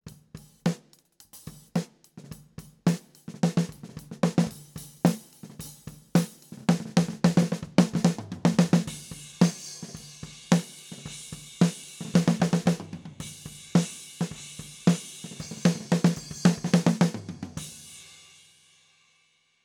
kick head off close snr

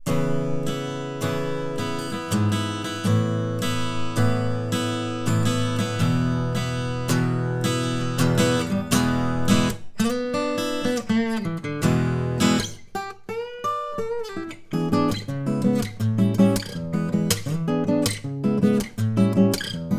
alt gtr

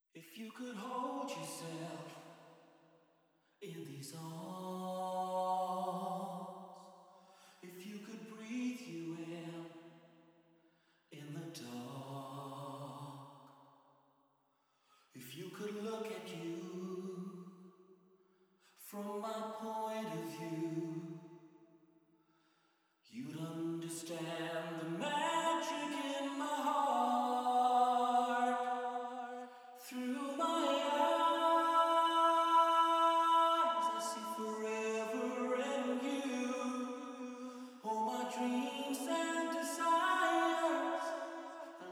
vox auto fx returns

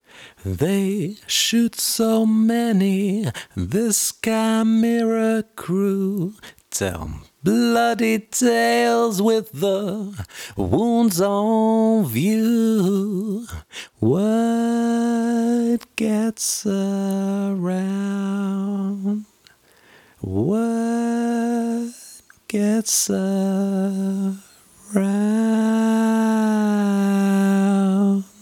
vocal chorus processed dry

